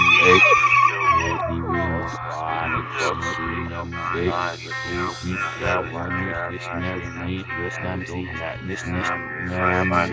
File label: evil sounding soundclip